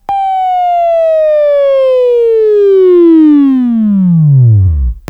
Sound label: Buzz; Labview-Program; Sweeping Training program